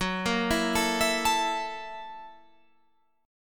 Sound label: Gb 7th Sharp 9th Gb, Guitar Open F; Gb7#9 arpeggiated